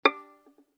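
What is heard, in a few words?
Violin pizzicato fortissimo on A string D6 stereo; MIS Pitches - 2014; Strings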